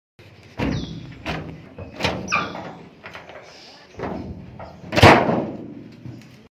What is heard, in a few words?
capot; کاپوت ماشین